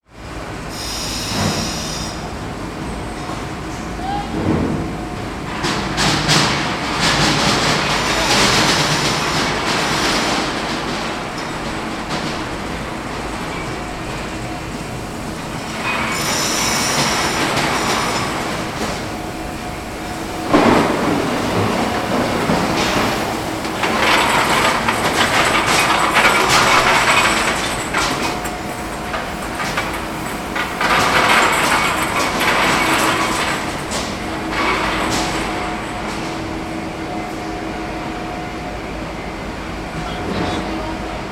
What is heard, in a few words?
Foundry